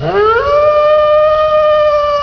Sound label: wolf